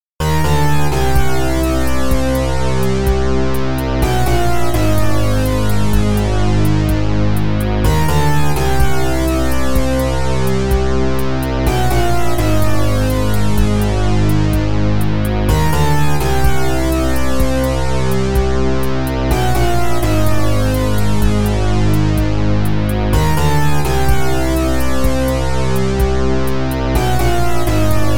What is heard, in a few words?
virtual analog synthesizer